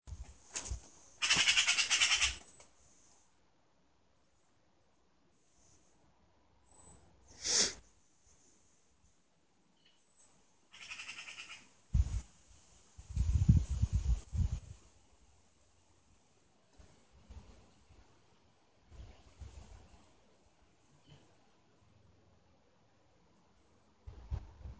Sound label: Bird Call